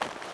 Idle leather small

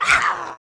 DMG dragon

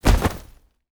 Body Fall

